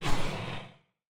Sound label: Soft Slide Fast